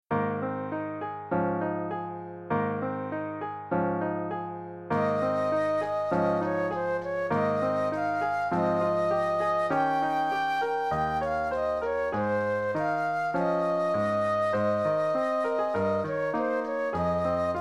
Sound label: flute and piano; At Calvary